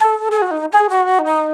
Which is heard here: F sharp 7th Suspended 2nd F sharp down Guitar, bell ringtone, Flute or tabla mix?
Flute